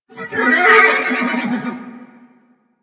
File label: Horse Vocal Mount Neigh; Vehicles; Duviri